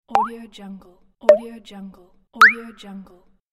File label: attention click; pilot-audio